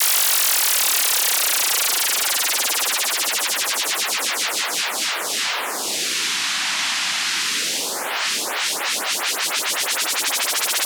SFX Down & Up; One-Shots; Ghosthack; Color Bass Essentials

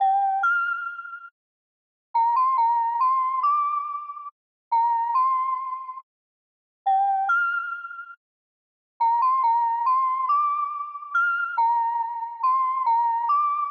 Lead, 140 BPM G Min; Cymatics; Gems Free Teaser Pack; Cymatics Gems Vol 3; Homecoming

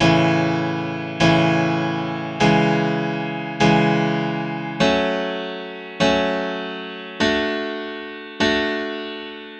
Grand Piano 100 BPM (F# Minor); DragonFly; Ghosthack; Free Guitar And Piano Samples

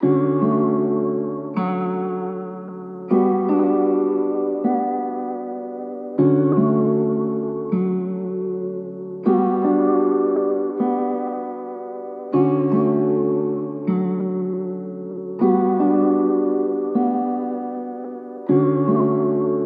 78 BPM D Maj Guitar; Melody Loops; Making The Bed; Cymatics; ANALOG EVOLUTION Beta; Lofi Collection

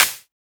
Clap, Psytrance

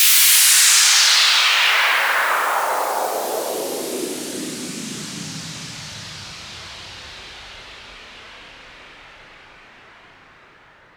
Downsweep; SFX, SweepDown; Ghosthack

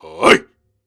Grunts and Chants, Viking Vocal

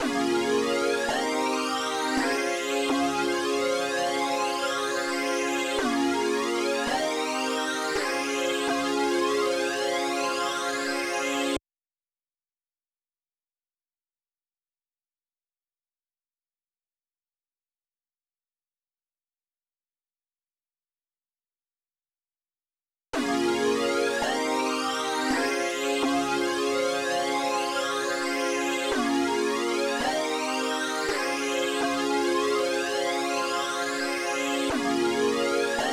166 BPM G# Maj Synth, melody loop stem; Loop Stems; Cymatics; 2022 Melody Collection - Wavy; Foreign

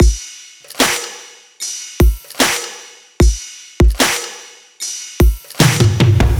Full Drum Loop, 150 BPM; Cymatics; Light Free Sample Pack; Bonus Samples

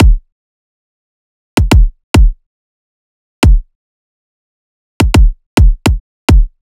Riddim Drum Loop 140 Kick

